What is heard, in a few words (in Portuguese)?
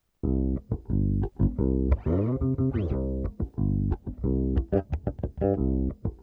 Baixo Vaneira Loops